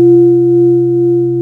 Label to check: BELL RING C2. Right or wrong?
Right